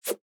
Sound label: Dash; Boomerang Fu